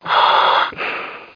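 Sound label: gas mask; rott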